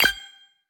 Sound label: Add Point; boomerang fu